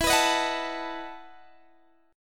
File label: E Major 7th Suspended 2nd Suspended 4th, Guitar, DADGAD